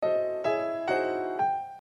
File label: resonance